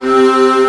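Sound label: STR C0 FF, strings; Sound On Sound - Peter Siedleczek's Orchestra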